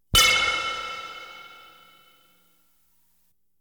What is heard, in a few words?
Science Fiction effects, Lasers, Light beams